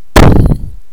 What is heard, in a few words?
Crash; Gravity